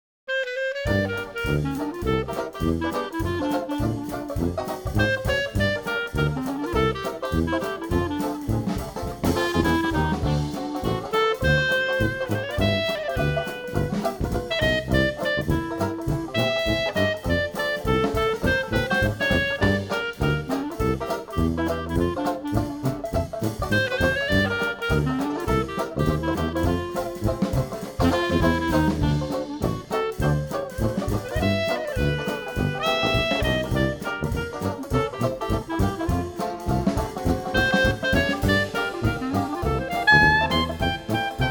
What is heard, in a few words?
Quartet, Dixieland Jazz; Live Band; Hotlanta Dixieland Jazz